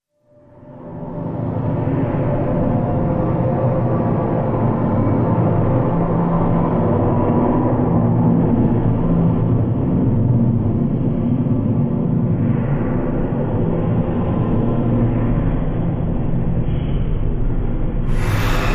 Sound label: Dark Omen Ambience